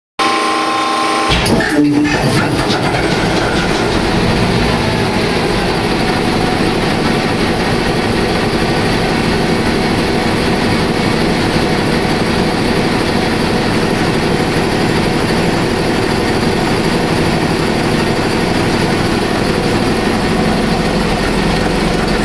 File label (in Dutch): Start Motor; HLD; Reeks 59